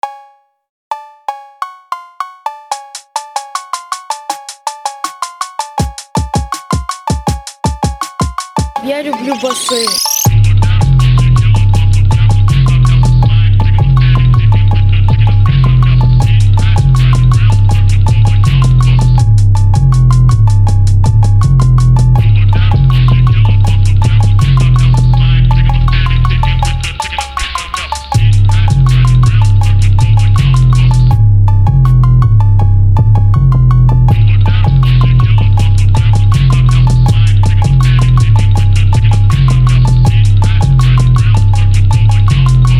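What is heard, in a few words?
I love bass, PHONK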